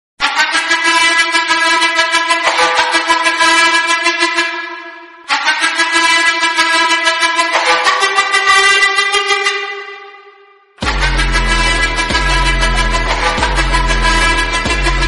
gaming background music

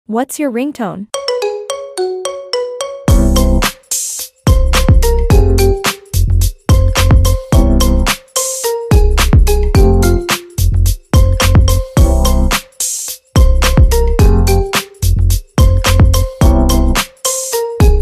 3d ringtone marimba remix ringtone

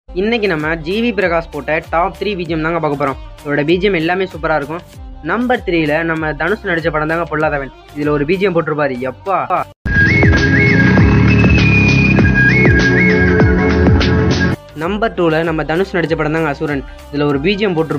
bgm in tamil cinema

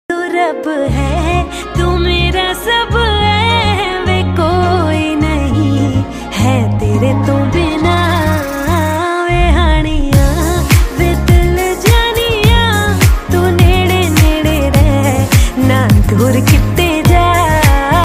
female version

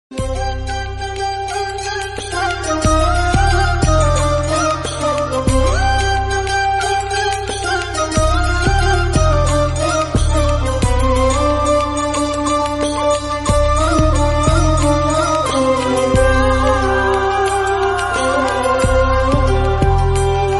song bgm ringtone

